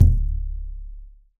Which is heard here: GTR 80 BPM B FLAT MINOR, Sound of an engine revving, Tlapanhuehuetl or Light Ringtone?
Tlapanhuehuetl